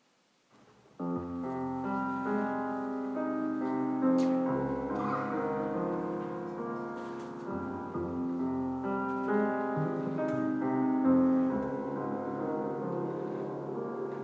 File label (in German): Alt I, Sanctus; Messe; Chor